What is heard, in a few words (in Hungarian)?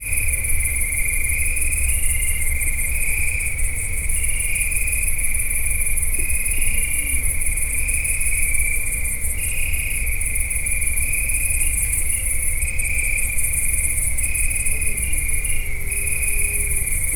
szocske magyar tarsza fele; allathangok; termeszetben; borsodi karszt